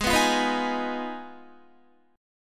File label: Ab Minor 6th down, Guitar; strummed; JGuitar